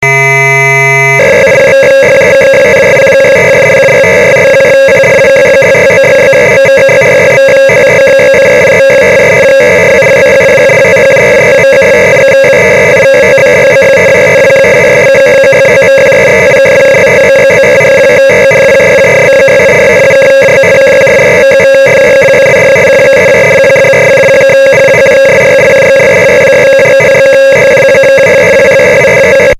prophet5

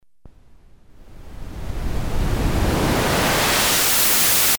noiz tone; 音色; Semitonesノブは、波形をNOISEに合わせたときにはその音色; nord

